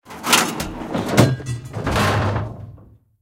howitzer shot mech

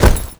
foley run

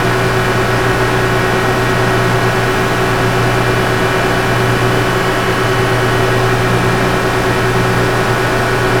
airport fuel pump; Call of Duty Modern Warfare

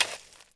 Dig Dirt; Civ4 warlords

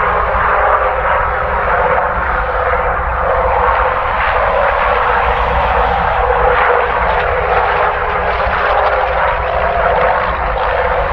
Aircrafts Engines Packard Merlin Prop; DCS

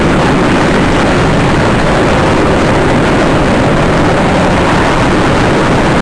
Plane Super Sonic; DCS

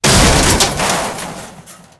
part bounced, tanks

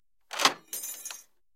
12mm m2 mech, guns tanks; War Thunder

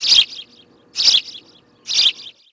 sparrow far; ambient birds; World of Tanks Blitz

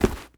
small stash deploy; Rust

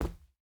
footstep boots cloth jump land; Rust

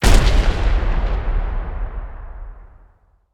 ground explosion layer; War Thunder

